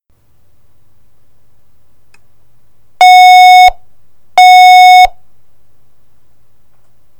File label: Twin Beeps, tone ringer